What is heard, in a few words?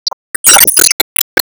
Team Ball Fly High; Disney Star Smash; Android, iOS, Mobile